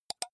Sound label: computer mouse left click single fast